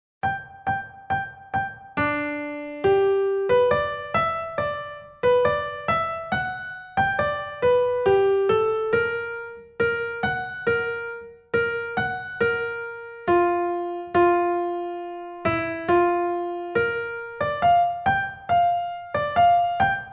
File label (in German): Zauberklavier 138 Primo; Uebungs-CDs; Playbacks